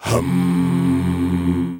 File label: Male Voice, African Voices